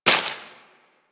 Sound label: Bullet Impact Debris Grass; Warframe; Weapons